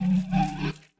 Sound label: staff rattle; smite; ahpuch skin10